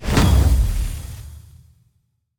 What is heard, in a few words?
summon; megaspud